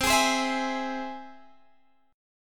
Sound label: C Augmented down Guitar Standard; strummed; JGuitar